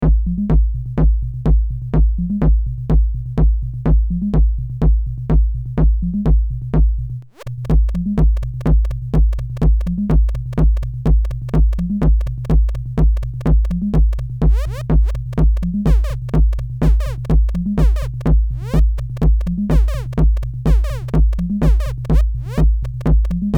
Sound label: VCF Sinus Bass FM Perc.; L.E.P. LEPLOOP V2